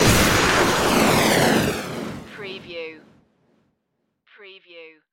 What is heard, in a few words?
Magical Projectile Launch